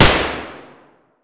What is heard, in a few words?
rem700 indoor silenced close; Escape from Tarkov